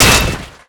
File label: metal gloves hit world, weapons; tf2